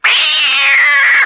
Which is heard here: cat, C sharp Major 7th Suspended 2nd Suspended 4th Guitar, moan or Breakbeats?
cat